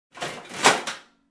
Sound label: Oven Toaster Door Clank